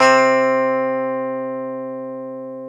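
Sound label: E 12 String guitar; Club-50 - Foundations Roland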